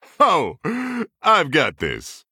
Male Human Attack

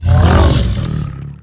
Clumsy Kodo Play

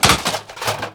Shotgun Underlay Death

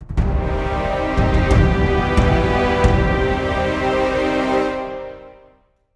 Stinger; High Cultist Basaleph

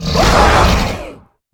Clever Razormaw, Attack

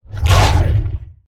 Attack

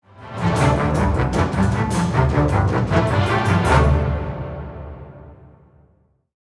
Play Stinger; Siamat

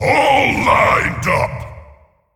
Male Demon Attack

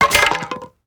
epic staff underlay death

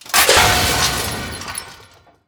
Armor Type A Death Underlay

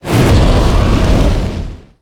Attack

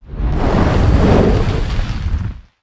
Fire Start

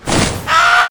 Death Underlay; Kragg

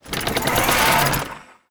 Clunky Mech Large Attack Underlay

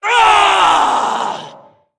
Female Dragon Death

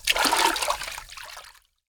Underbelly Angler Underlay Death

